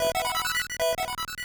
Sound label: Start; Abadox; NES